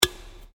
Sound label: Can Aluminium Inside Crack; various metal cans; Cracking; Objects; SoundEffects-Foundation1